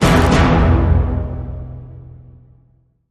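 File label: Hit Orchestra Tutti Double E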